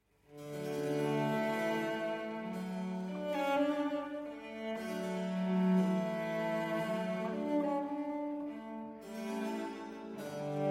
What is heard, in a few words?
Cello Concerto in D Major, Affettuoso; Vivaldi; Christophe Coin